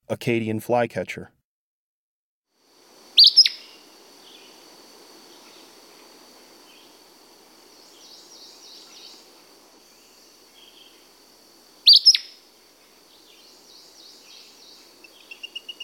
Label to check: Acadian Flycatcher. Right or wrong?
Right